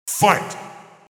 start match

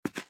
move

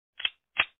countdown

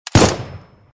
98k shot

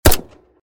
mp5k shot

